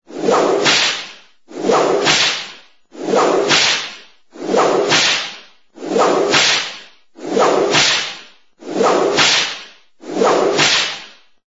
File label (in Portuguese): Vinheta Chicote